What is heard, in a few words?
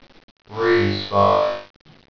Respawn